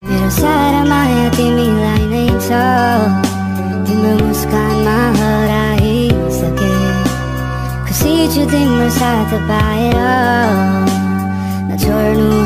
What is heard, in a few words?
Song